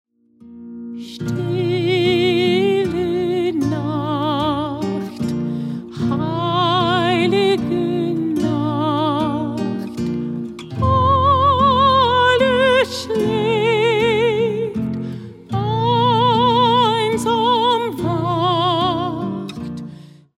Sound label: Silent Night Stille Nacht Noche de Paz; sacred christmas